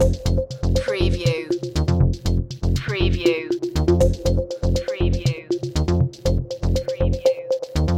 turn on loop

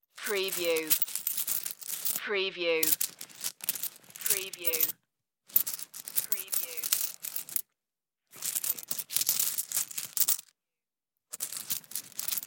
rummage in the wallet

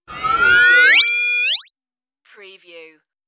tonal transmission interference; radio; AM / FM tuning, static radio sounds

